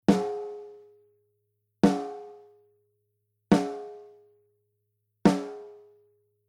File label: EQ Comp Snare; Summit; eq compression